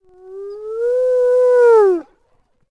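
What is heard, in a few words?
Wolf Fidget Vox; Civ4 warlords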